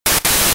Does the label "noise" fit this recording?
Yes